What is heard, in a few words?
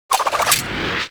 Crew Ship Pilot Finisher 3D; Railjack; Grineer; Cinematics